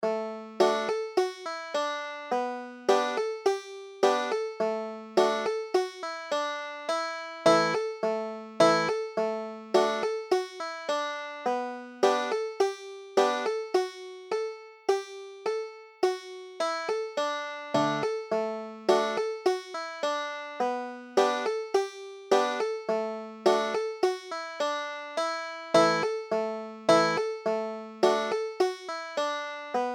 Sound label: banjo